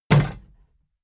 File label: plastic box big kick rattle; soundpool